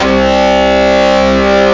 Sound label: dirt guitar